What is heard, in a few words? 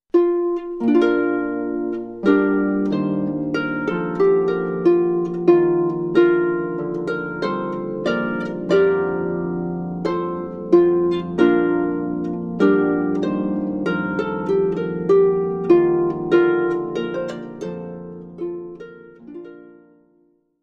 Pavane Style Renaissance, harpist